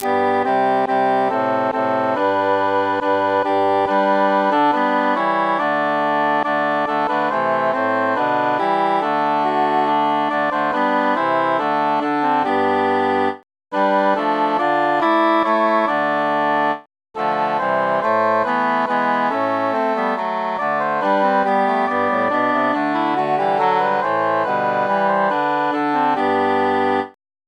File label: altus mute; now is the month of maying